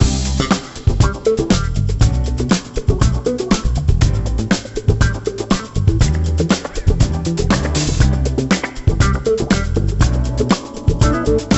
Music; Imagine Interior Designer; 2008 NDS gamerip

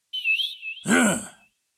Dogcall; Puzzle Chronicles; PS3; PSP; X360

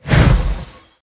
Gust Small Positive

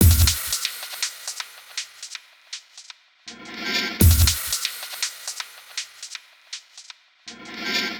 perc loopD 120bpm

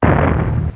Demolish

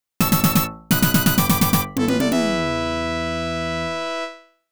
BGM Clear; Oretachi Game Center Zoku; Contra

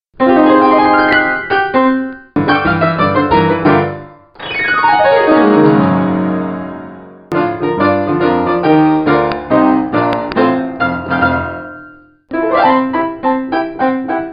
Piano Riffs; Mama's Piano Riffs

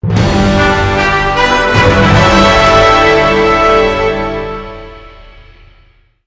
Fanfare Class Change; Fire Emblem Warriors Three Hopes

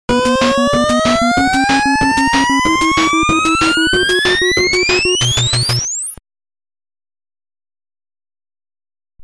Unused Warp; Star Trek V The Final Frontier; NES

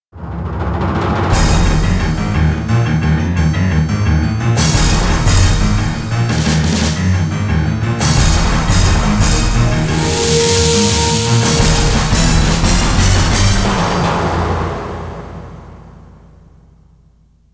Short Jingle; Soulcalibur; Dreamcast

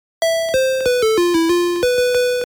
Shamisen; Kemco; Nekojara Monogatari

